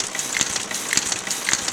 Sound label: MACHINES